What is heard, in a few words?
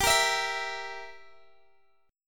G Minor 6th, Guitar DADGAD